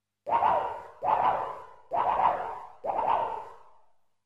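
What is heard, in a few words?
electronic sounds laser sword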